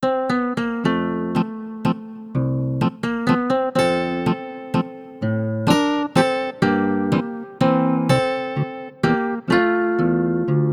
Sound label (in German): Zither; Turbosounds App